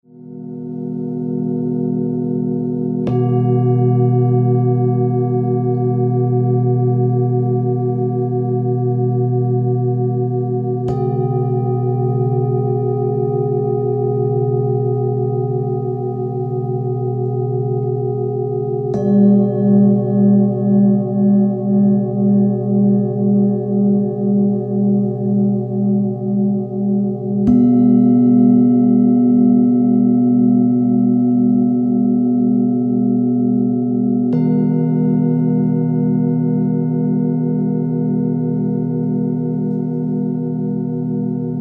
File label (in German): Klangschalen Träume; Die Schwingungen von Feuer, Erde, Luft und Wasser genießen